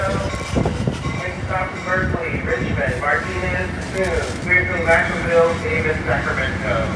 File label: train